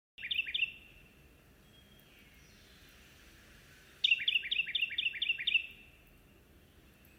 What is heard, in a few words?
carolina wren song